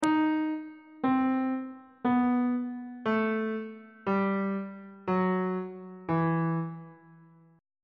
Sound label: d double sharp harmonic minor scale descending; bass clef